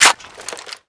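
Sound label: weapon foley scar reload hit; Call of Duty Modern Warfare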